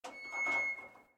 Wheel Rotat Creak

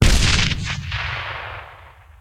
explosion med

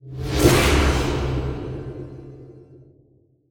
Level Up; Regions of Ruin

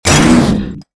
Boss Hit; Enough Plumbers 2

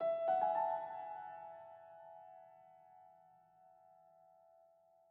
Env Field Day Ground

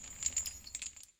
Chain Move Slow; Puddle

